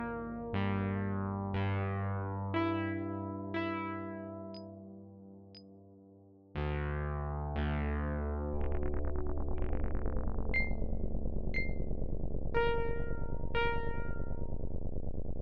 random notes, an action function that plays a random note each time it is triggered